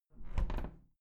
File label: window plastic squeak close soft